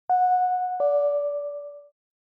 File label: Doorbell Ding Dong